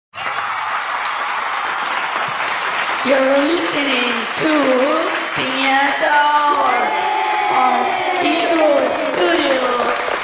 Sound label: intro auto tune hi